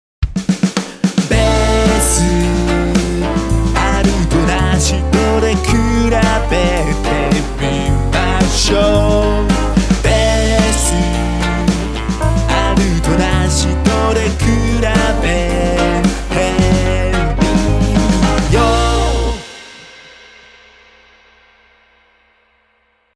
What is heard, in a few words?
bass